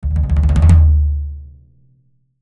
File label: Musical Percussion, Musical Percussion Hit To Roll Tom Deep; Hits; Blastwave; Sound Effects:Musical